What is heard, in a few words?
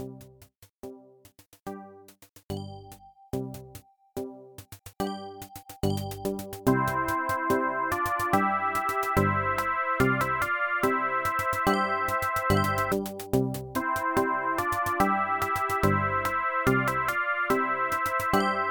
drummer; xmas